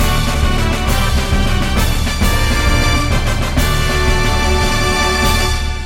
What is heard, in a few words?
chrono cross victory